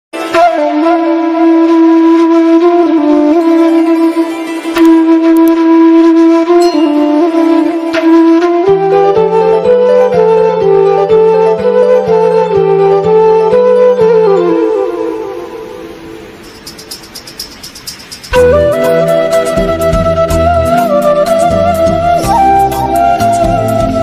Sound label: Tamil ringtone bgm ringtone